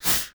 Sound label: Steam short